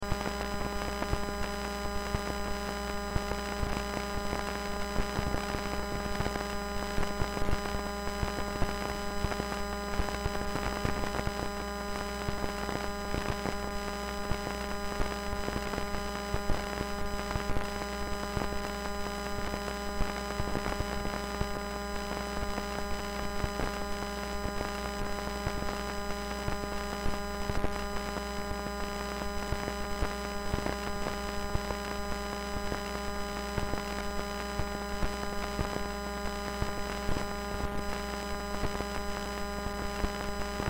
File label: Cell Telephone Interference Digital; Signal Distortion Loop; Cell Phones; Blastwave